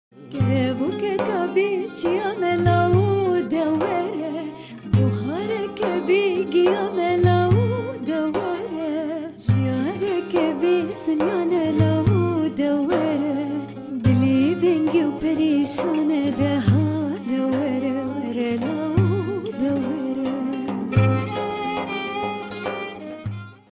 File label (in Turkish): kurtce kurmanci sarki